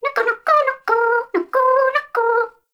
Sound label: baby vocal singing